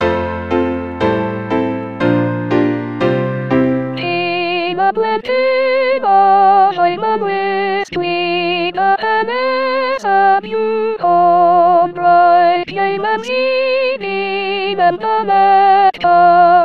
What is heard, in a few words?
Soprano